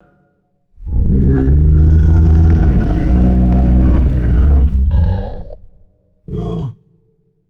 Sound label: deep tubular rattling creature growl